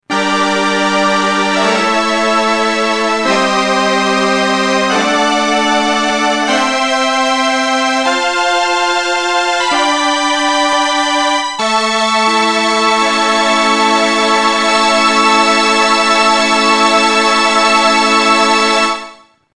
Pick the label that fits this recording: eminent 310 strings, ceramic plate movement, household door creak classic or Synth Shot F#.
eminent 310 strings